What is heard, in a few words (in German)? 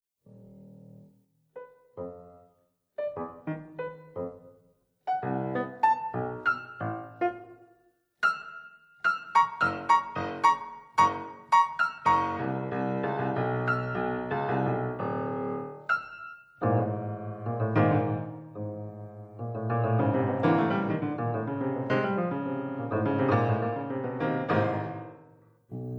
klavier